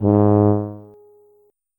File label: tuba note